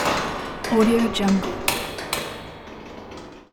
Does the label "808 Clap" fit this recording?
No